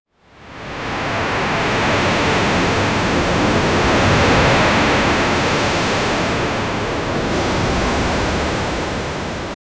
a massive ocean wave dest

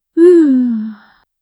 short female vocalization, egyptian

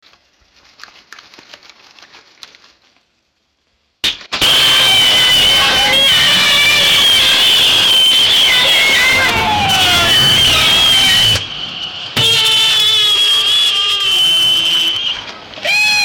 a car crash followed by

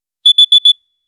thud followed by a short, electronic beep

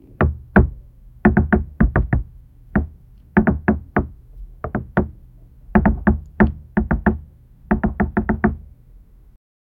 sounds of a wooden ship

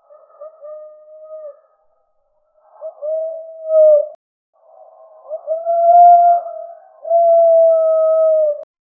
sound of swan

